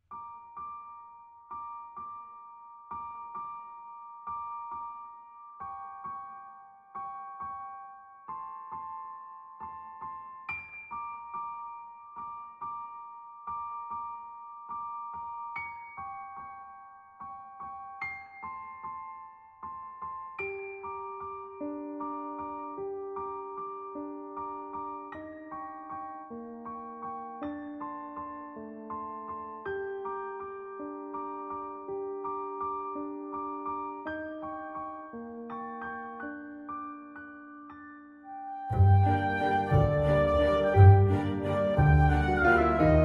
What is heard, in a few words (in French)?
Valse finale